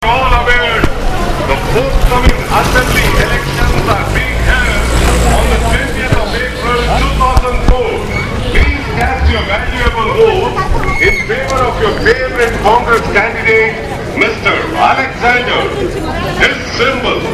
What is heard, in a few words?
election sounds, india; Ted P. Eugenis